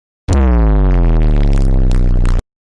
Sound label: bass bosted